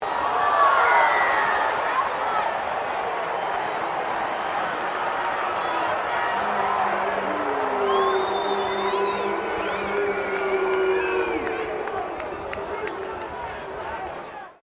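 crowd 15K Rugby World Cup boo, Rugby world cup game; Crowds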